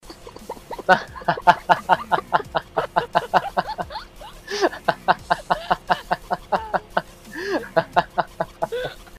laughing

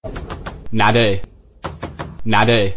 knock knock

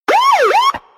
siren pull over

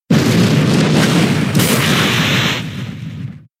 fire blast

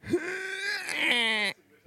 strained wah

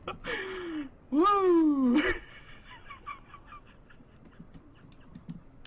wooo hehehehe quiet

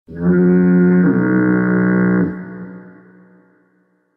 two tone boat horn sound used in films from 60s

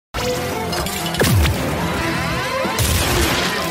zipper punch

